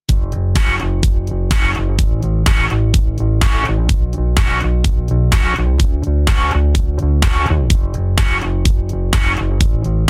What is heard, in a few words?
Instrumental